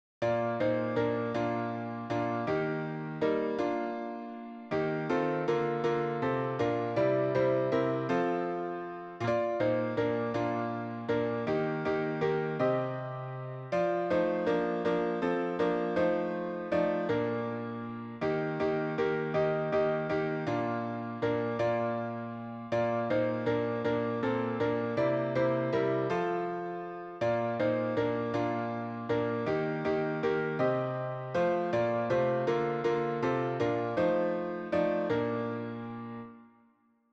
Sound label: piano only; Daynes